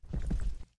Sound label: jump land